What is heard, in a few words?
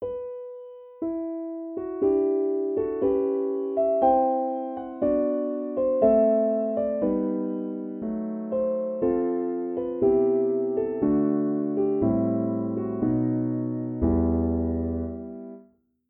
Grieg Piano Intimate Grand